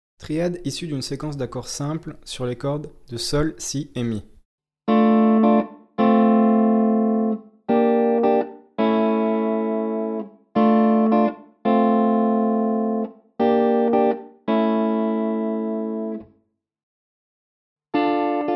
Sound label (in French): triades